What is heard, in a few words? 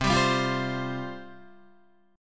C Suspended 4th C, Guitar; Csus4 strummed; 7,10,7,10,7,8; JGuitar